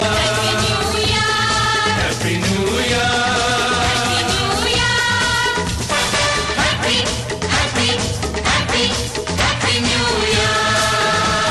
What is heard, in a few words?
Happy New Year Ringtone